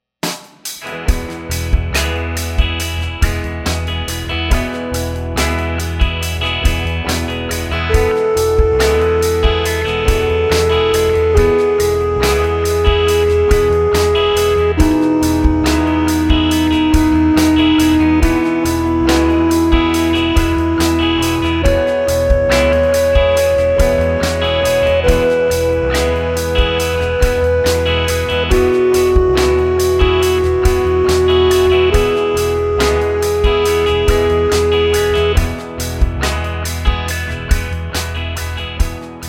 scale degree

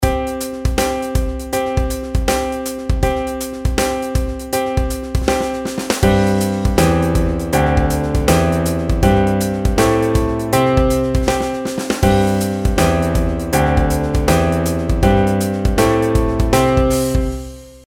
pitch patterns skipwise

